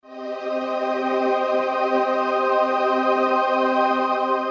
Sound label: Untidled Ring Ton; X8